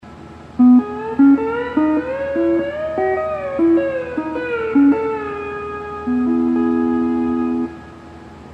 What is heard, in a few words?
hawaiian piece